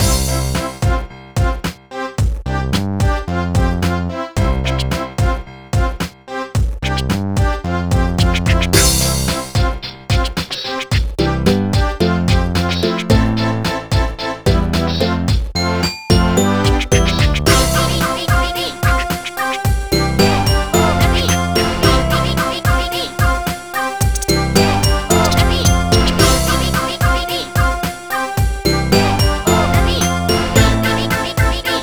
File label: Music BPM105; Super Scribblenauts 2010 NDS; LVL12 GREATMETRO2